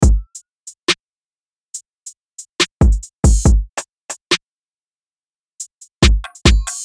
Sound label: UK Drill UK Grime 140 BPM